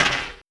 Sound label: Percy Hit, FX; Sonic Foundry (Sony Creative Software); Esoterik Beatz